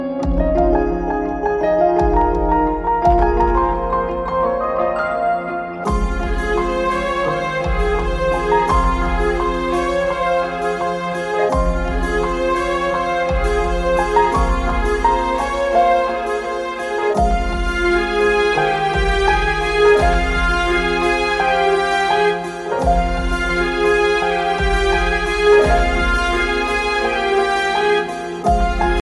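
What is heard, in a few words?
love music malayalam ringtone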